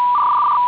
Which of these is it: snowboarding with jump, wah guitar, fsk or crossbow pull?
fsk